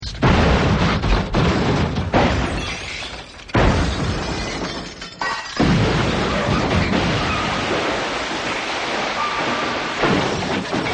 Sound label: Crash Effect